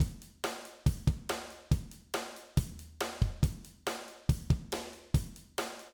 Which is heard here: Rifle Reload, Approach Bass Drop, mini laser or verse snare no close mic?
verse snare no close mic